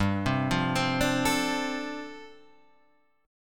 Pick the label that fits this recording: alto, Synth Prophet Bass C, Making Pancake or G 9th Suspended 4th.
G 9th Suspended 4th